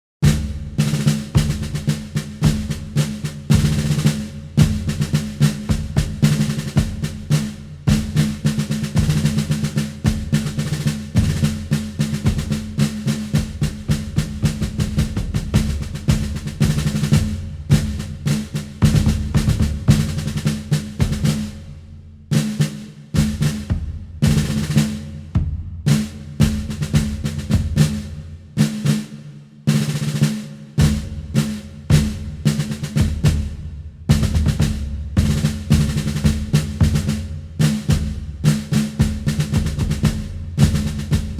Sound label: drumroll; russian invasion of ukraine